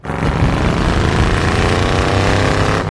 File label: Motorcycle engine Starting furiously